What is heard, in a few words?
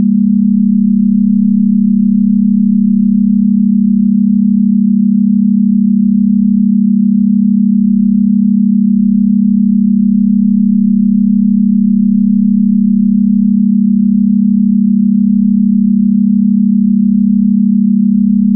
tesla 33hz; wardenclyffe